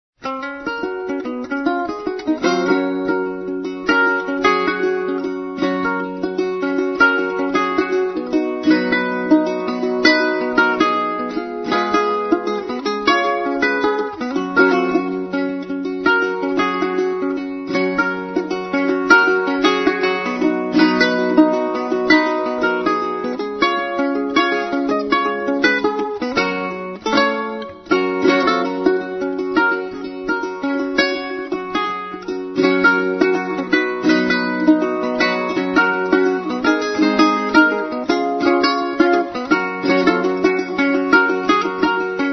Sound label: jingle bells